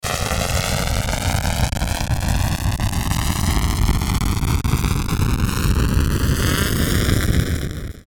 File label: Power Up Distortion Ascend Build Up Low; Rise And Dive; Blastwave